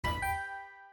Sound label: chime